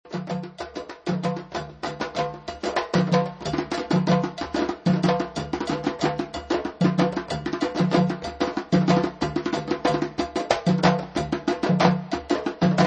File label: kaki, drums